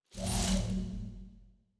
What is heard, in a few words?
designer scifi mechanical servos; SoundsCrate